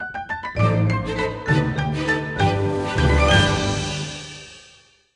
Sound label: Stinger Lose Outro